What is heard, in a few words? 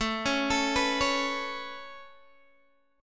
A Major Add 9th A Guitar Open D, chord